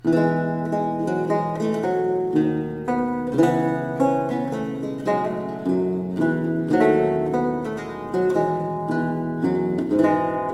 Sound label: menuet